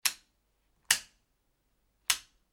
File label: household switch light button domestic; Light Switch ( Wall Mounted ) Clicked Multiple Times